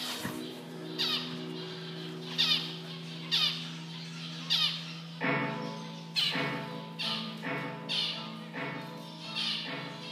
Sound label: Birds Guitars